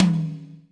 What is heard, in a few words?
acoustic tom single hit e key, Royality free tom tuned to the E note. Loudest frequency: 867Hz; tom drum samples